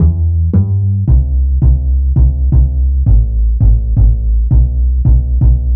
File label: Fat Upright, Bass Lines 166.5; Jungle Warfare 1&2